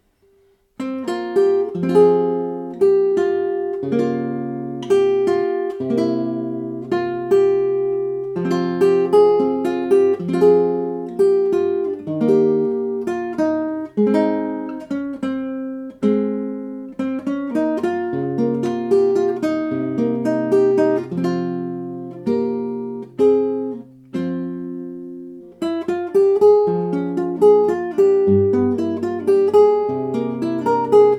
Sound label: harp en Capo 3